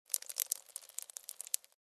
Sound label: Blood dripping onto the floor; Free Blood Sound Effects